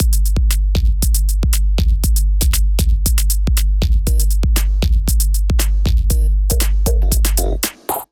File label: Kit groove 118 bpm; MIDI Clips; 89 - 120 bpm; Token Kit; Ableton; Glitch and Wash